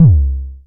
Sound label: analog pitched, kick; Ableton Foundation FreePacks